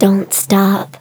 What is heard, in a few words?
Vocal Phrases; Vengeance Samples; Vocals